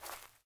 Impact Light; Puddle; puddle plus ios gamerip 2018

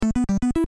check point; Pixel Devil and the Broken Cartridge

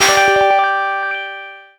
Character Attack

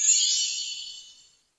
Magic Explosion; Geometry Dash

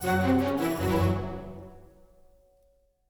Music Fight Brigands Theme Easy Bpm160 End; asterix obelix slap them all 2

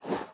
Turn

